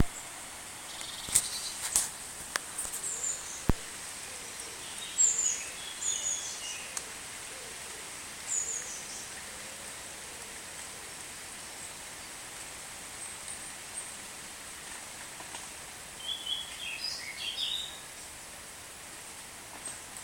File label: a woodland glade at rspb middleton lakes during a rain shower spot the woodpecker